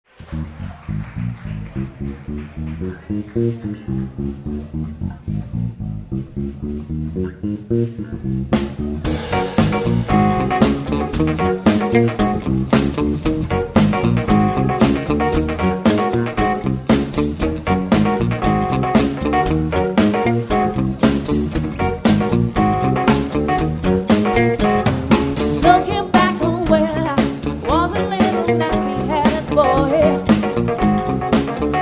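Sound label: Quintet (vocals, guitar, keys, bass, drums)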